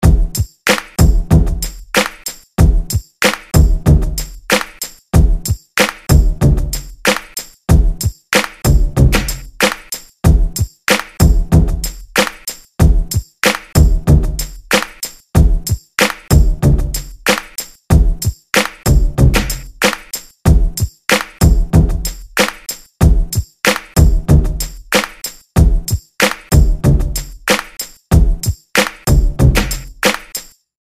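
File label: guitar 94bpm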